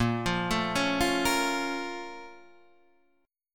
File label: Bb Minor Major 7th, chord; BbmM7; arpeggiated; 6,8,7,6,6,6; JGuitar